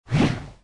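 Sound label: whoosh large; snow white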